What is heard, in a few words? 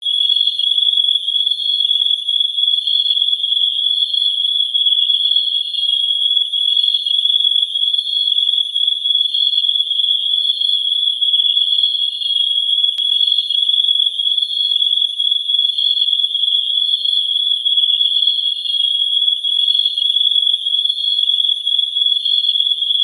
ambient bugs; bgm; world flipper